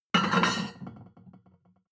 plate on plate place slide medium, restaurant cafe; sport-freizeit